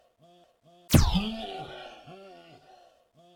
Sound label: Lazer Shot